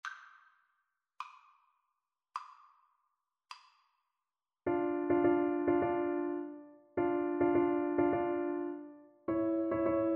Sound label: horn, French Horn